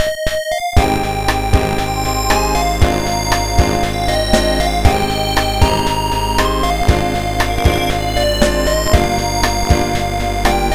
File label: Melancholy Girl multichip